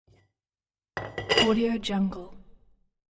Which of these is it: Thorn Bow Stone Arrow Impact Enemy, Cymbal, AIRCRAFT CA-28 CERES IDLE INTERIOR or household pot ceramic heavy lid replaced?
household pot ceramic heavy lid replaced